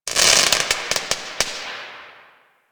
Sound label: Firework Crackle; Fireworks; Warframe